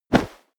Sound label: step walk snow